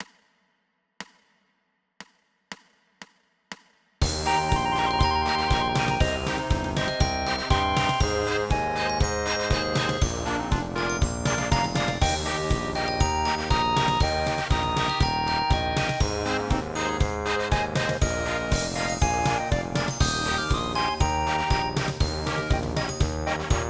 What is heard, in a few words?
Eb marching band, tenor horn; warmups